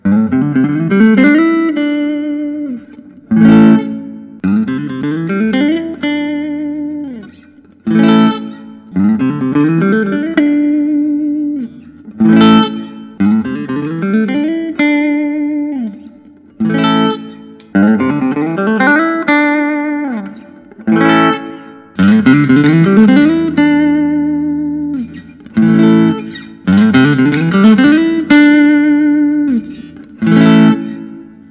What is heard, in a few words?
prs p22 trem 10 top; solid ros 2014; orange tig